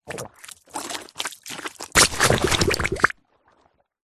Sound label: cartoon slime wet splats drips squelches; zapsplat